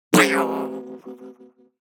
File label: Impact Comic Spring Hit Medium; Hollywood Edge; sound designer's toolkit v2